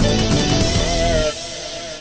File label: combo; BALLY; CORVETTE